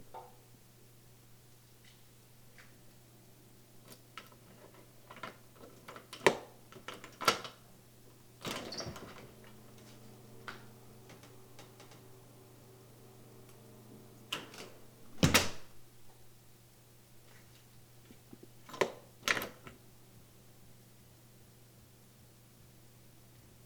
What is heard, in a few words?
Opening And Closing Bolt Door